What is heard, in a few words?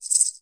rattle, ethnic and world percussion; Rattles